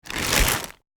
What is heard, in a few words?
Shopping bag movements rustling